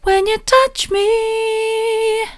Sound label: when you touch me soft, vocal